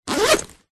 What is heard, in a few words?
luggage camera bag zipper; zapsplat